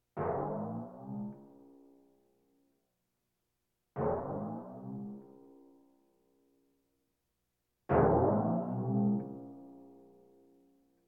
Percussion Bongos Tympani rolls Gongs Drums

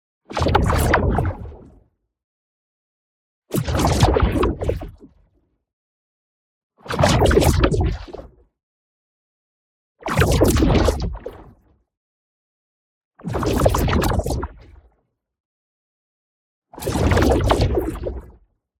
ABILITY RELEASE Slow Liquid Wobble; boom library; Matter

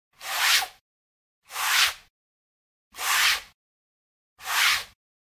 Generic Slide; boom library; The Interface

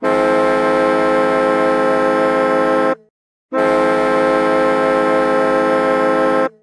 Hockey Goal, Hockey Horns & Buzzers; SFX Kit; FloridaVancouvr

